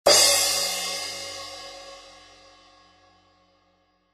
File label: 14in Custom Crash, cymbal